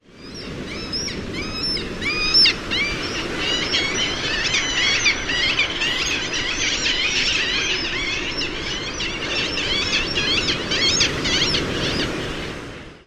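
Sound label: high squeals, Red-legged Kittiwake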